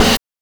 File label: OH; NES DPCM; MIDINES